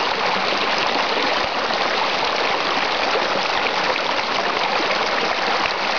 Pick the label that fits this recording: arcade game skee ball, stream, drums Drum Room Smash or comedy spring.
stream